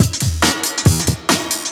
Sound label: Breakbeat; Vengeance Samples; VEC2 Loops